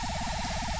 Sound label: WAR WALK; HOBOBLADE